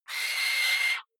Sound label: owl shriek; natur tiere; voegel